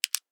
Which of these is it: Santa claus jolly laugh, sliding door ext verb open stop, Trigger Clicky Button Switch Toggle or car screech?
Trigger Clicky Button Switch Toggle